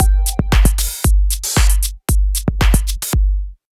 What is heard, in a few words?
Frisky Bouncy Bendy; Sony; Xperia Movie Creator Music